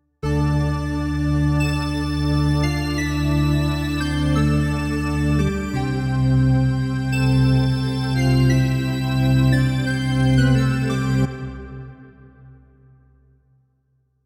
Yamaha Reface YC wave y

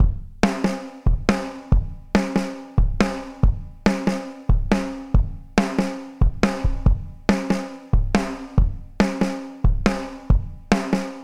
drums superfuse maxinput; chandler limited rs124 test

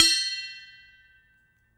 Metal Canteen Felt Stick; Foley; Ghosthack Foley Samples and Sound FX

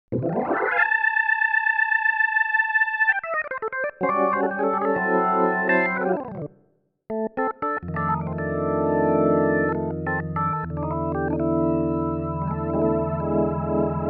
kawai ca501 blues organ

